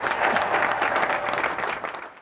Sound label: complete focus; Hearts of Iron IV Death or Dishonor